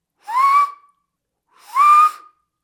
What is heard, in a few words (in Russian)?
zvuk svista